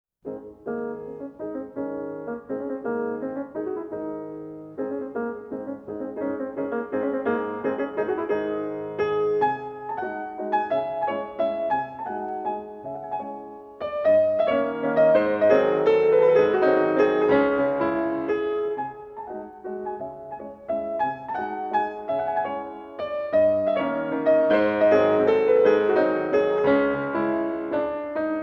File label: Mazurka N 2 in C sharp minor, Op.6-N 2, Piano